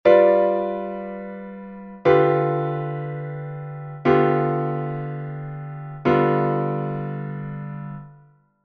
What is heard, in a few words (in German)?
terzquartakkord; Terzquart-Accord, Terzquartsext-Accord; mendel reissmann